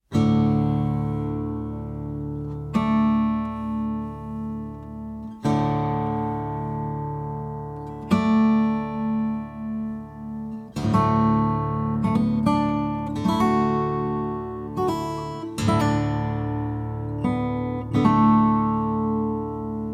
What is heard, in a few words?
Soothing Acoustic Guitar Scottish